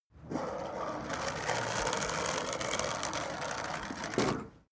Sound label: skateboard indoors drive light; sport freizeit